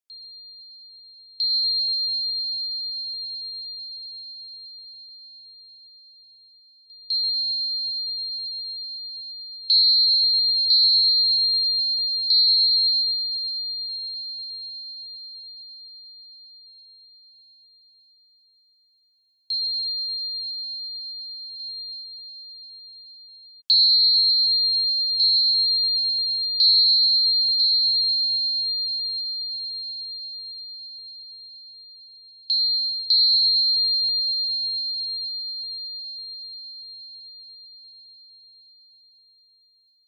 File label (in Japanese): furin solo